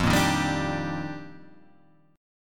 F 13th Suspended 4th F, chord

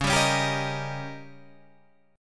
D sharp 7th C sharp, guitar

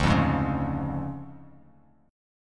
D Minor Major 7th Flat 5th D Bass Standard; 10,8,6,6; DmM7b5 strummed; JGuitar

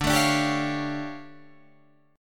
D Minor Major 11th, down, Guitar, Open G

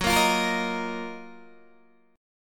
F sharp Major 13th, Guitar, Open D; 16,13,15,16,13,13

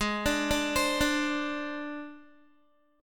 Ab Major Flat 5th Ab Guitar; AbMb5 arpeggiated; 15,17,14,17,14,x

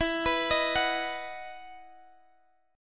E Major 7th Suspended 2nd, Guitar, Standard; x,x,14,16,16,14; arpeggiated; capo=3

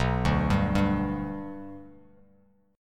B Minor Sharp 5th B Bass Standard, chord; arpeggiated; JGuitar

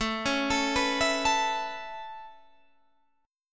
A Major Add 9th A Guitar Drop D, chord

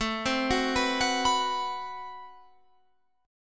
A Minor 6th Add 9th A Guitar Open F; 21,19,18,18,21,18; arpeggiated; Am6add9

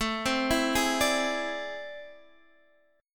A Minor Major 11th, down, Guitar, Standard; arpeggiated; AmM11; JGuitar

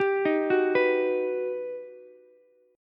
G Augmented Major 7th, Ukulele; G+M7 arpeggiated; JGuitar

